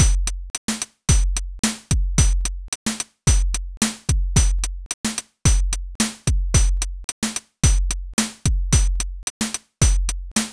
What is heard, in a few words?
afrobeat pattern